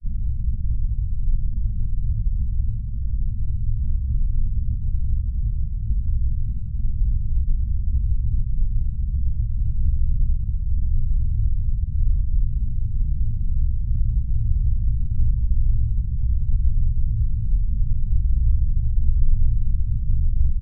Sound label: sound design drone dark; sound effects one